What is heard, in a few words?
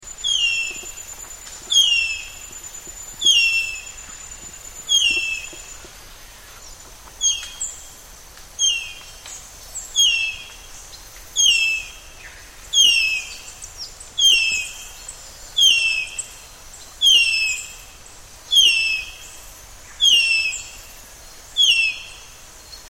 Harpy Eagle; Martyn Stewart; Nature Sound